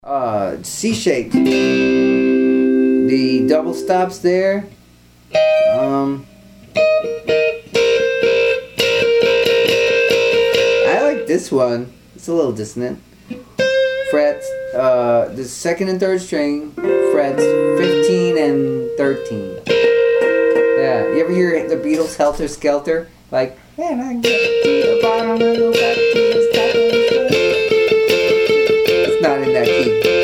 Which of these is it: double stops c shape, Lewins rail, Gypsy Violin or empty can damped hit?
double stops c shape